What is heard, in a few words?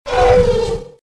Velociraptor - Cry; RadwinIsland